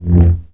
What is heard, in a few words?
swing